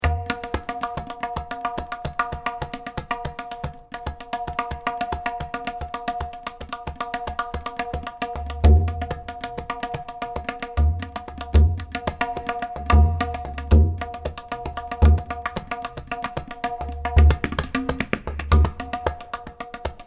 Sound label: Tabla solo